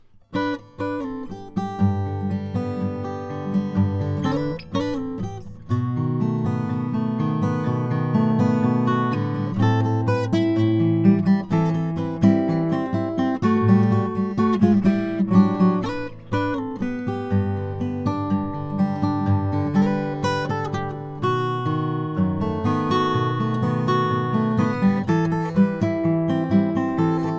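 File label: martin ss 00l art deco guatemalan rosewood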